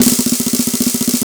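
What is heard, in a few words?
ROLL snare, drums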